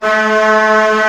ORCH D#1 FF, Orchestra; Sound On Sound; Peter Siedleczek's Orchestra

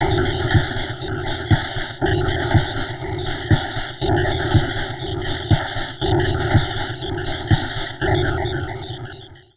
Drum Loops Crazy Processed

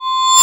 REVRS BELL; AKAI S-Series CD-ROM Sound Library VOL-1

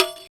Rek Khana Closed; Roland BK-5; Percussion

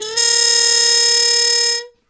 FX 3 A#; E-MU Formula 4000 Series Vol. 1; Hip Hop Nation; Phatt 4000

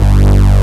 DUAL BASS; Pro-Rec - Synthsonic; BASSES

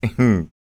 BIT 118, Vocal Blues & Soul

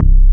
KNOCK BASS; SAMPLE WAVE MIXING 1

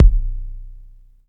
808 Kick, dry kicks; Quasimidi Rave-o-lution 309

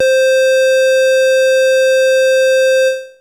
Techno leads, hits and riffs

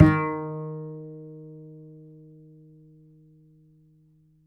Upright Acoustic Bass, 1ST POSITION; Larry Seyer

